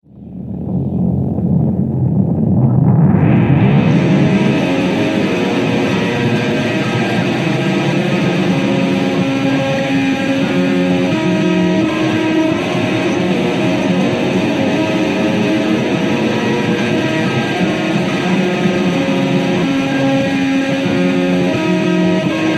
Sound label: samurai baby loop bass amp; kaolimba